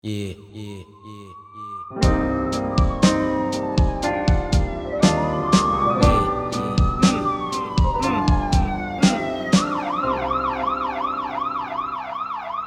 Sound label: Busted thug life wasted police; social media